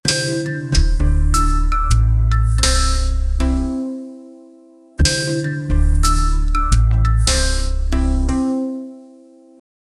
intro music to a podcast

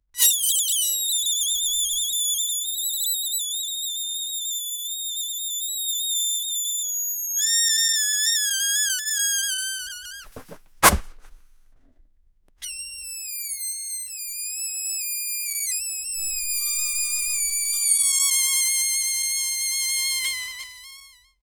a cluster of balloons, balloons

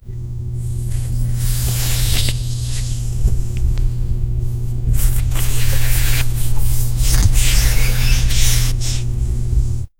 microphone of a camera

